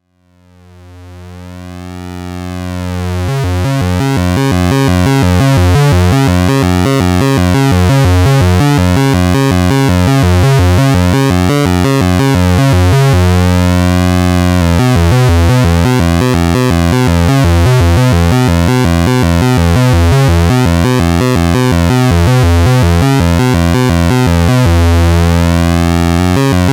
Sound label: PWM